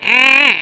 mole damage

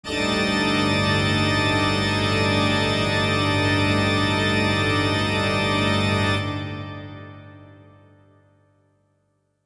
organ

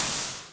ashring stand

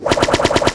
rainstorm

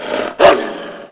wolf stand

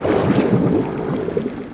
boss claner die

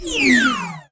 aimed

